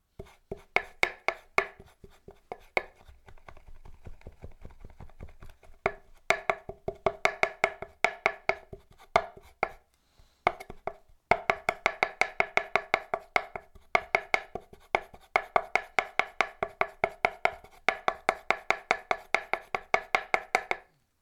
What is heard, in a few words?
Chopping With Knife